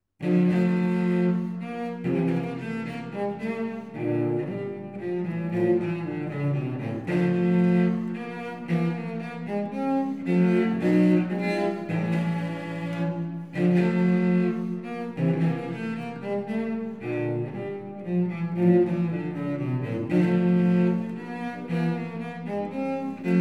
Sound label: solo cello; D minor; BWV 1008; Menuet I; Bach; Cello-Suiten